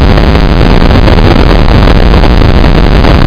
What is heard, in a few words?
RUMBLE